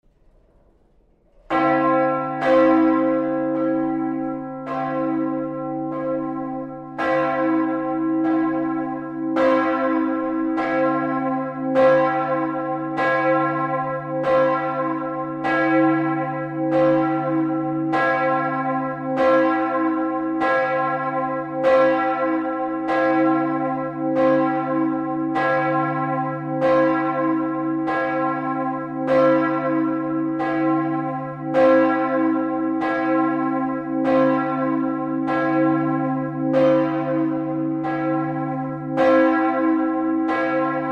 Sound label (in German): Petrusglocke